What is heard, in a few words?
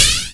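Snare, dry perc; Quasimidi Rave-o-lution 309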